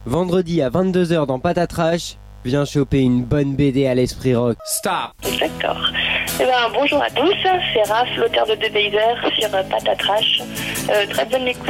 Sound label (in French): jingle